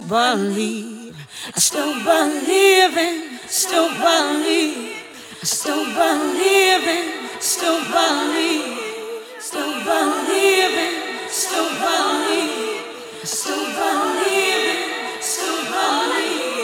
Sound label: Accapella